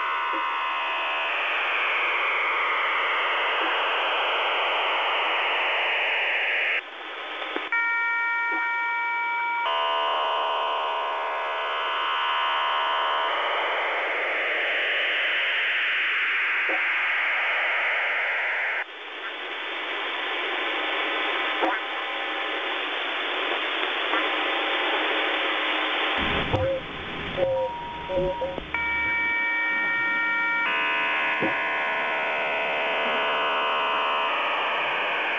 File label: websdr recording 4519.9kHz